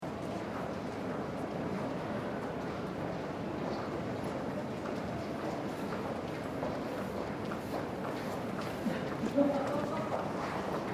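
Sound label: Interior Shopping Mall, Calm, airy, shopping center with occasional high heeled footsteps; Very faint voices in Swedish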